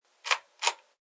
Pull Light, Basement light being pulled on by drop down chain.; Lights; Household